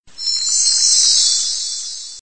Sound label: stars tone